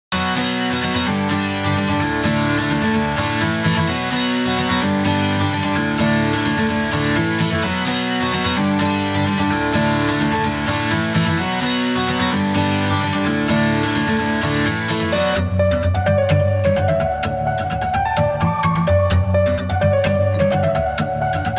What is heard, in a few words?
Strummed Acoustic